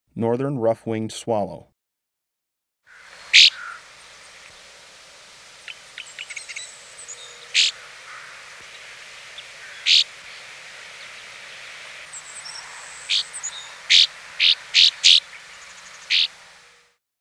Northern Rough-winged Swallow, Birds of the Chicago Region